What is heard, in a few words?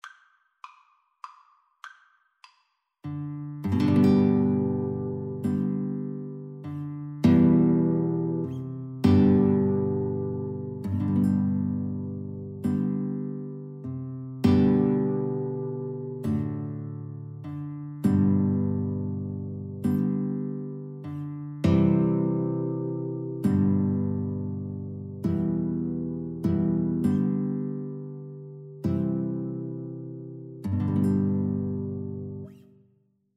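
amazing grace violin guitar duet karaoke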